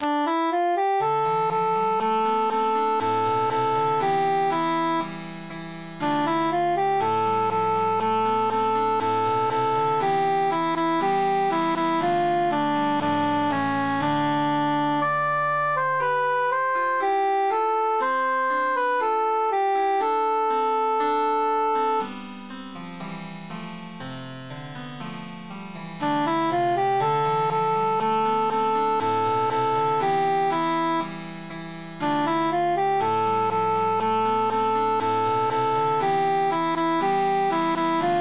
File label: cantus emphasized; il est bel et bon